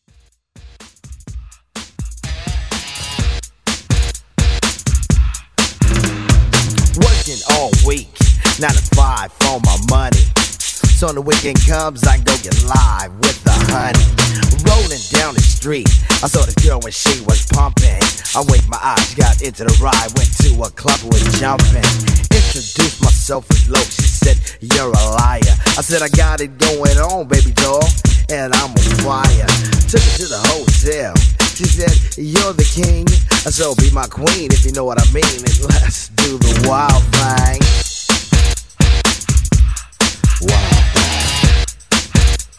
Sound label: 80s Hip Rock Redrum